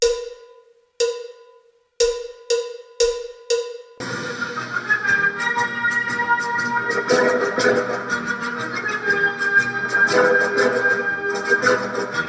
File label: Gb Key